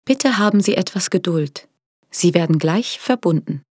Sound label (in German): telefonpaket warteschleife